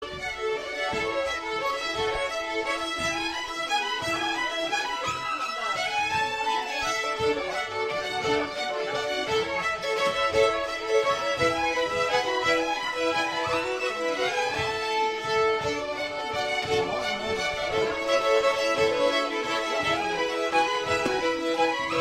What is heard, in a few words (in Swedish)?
Vals ork; Nylaner